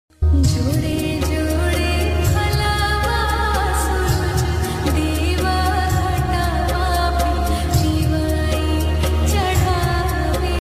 chath geet song bhojpuri; suruj dev

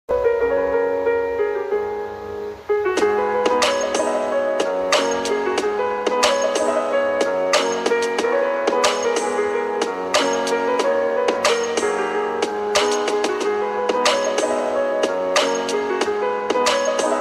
love bgm ringtone, flute ringtone; south bgm ringtone; tamil ringtone; telugu ringtone; amazing flute

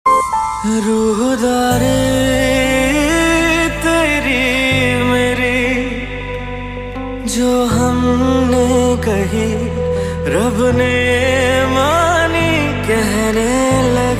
sad ringtone

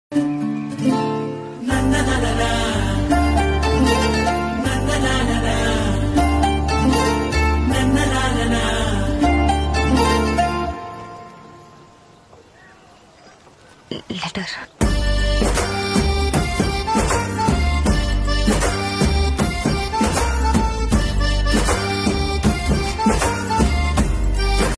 marriage band love bgm; ambajipeta

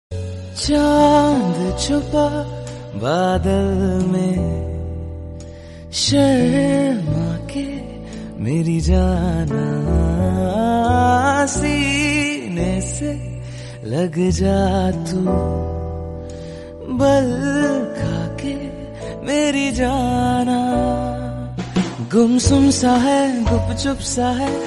hindi song

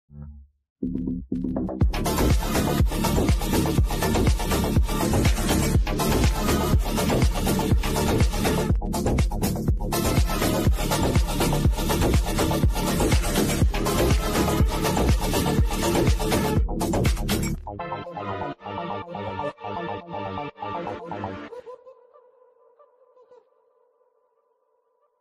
bgm song ringtone bgm ringtone

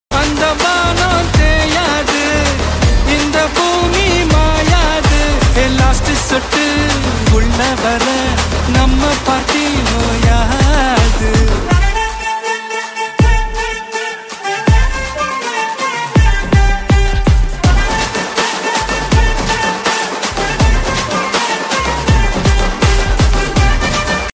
song

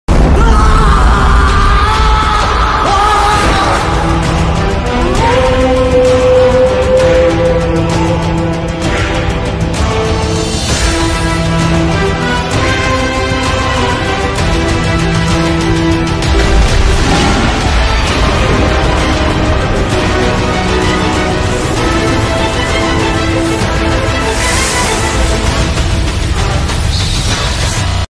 bgm ringtone background music; bhediya

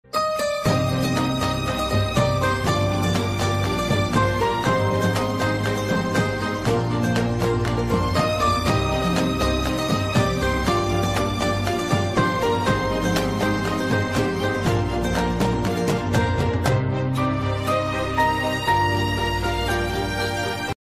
love bgm ringtone tamil ringtone; siva manasula sakthi

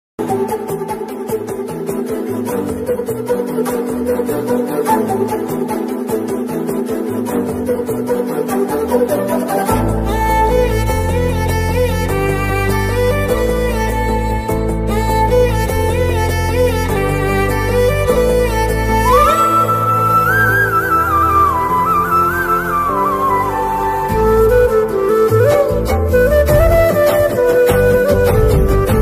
love song background music ringtone

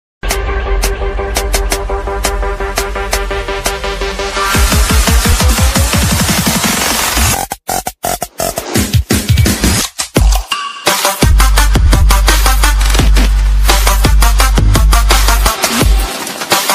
vlog intro music ringtone music

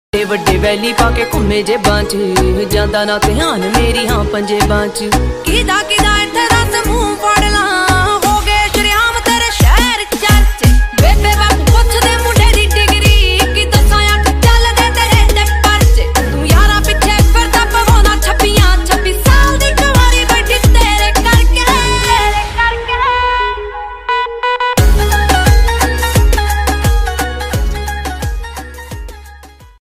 ringtone punjabi song ringtone punjabi ringtone

